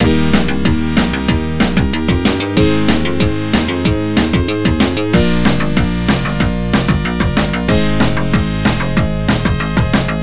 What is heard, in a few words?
Race BGM; Fun Car Rally; Genesis